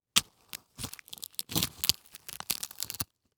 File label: Horror Scary Bone Crunch